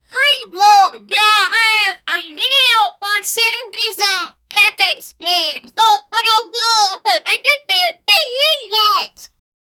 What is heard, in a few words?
spider hissing; insect